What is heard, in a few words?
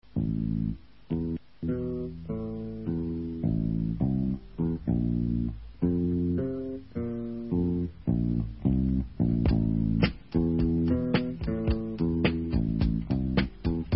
Instrumental